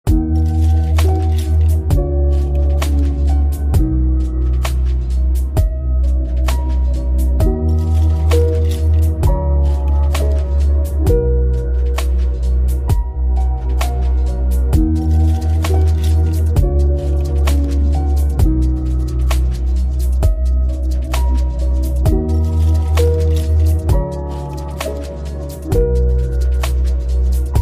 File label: piano alarm